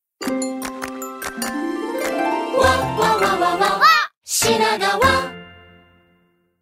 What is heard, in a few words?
sound logo